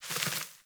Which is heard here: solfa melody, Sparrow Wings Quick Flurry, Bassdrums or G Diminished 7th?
Sparrow Wings Quick Flurry